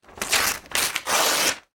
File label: paper tearing